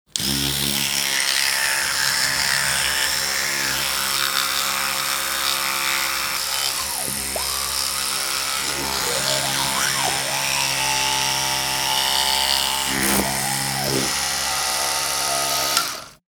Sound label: bathroom tooth brushing electric